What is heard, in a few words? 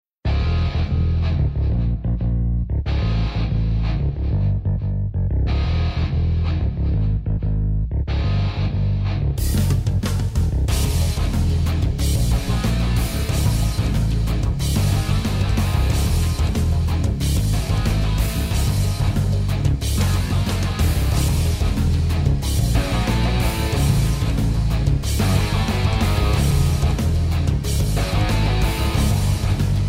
music maze boss; iDaggers; Android